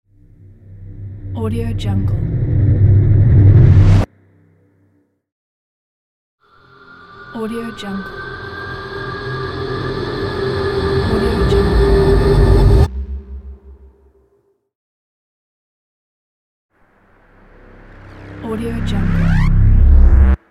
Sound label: abrupt scifi riser swells